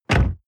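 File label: sports car exterior door close hard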